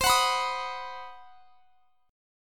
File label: C Minor Major 7th Flat 5th chord; CmM7b5; strummed